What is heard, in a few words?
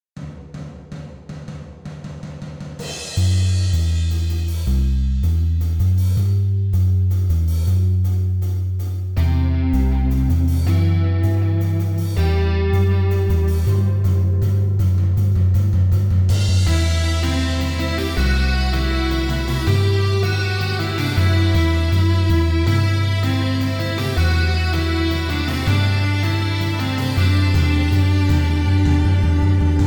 title theme stripped down drum in version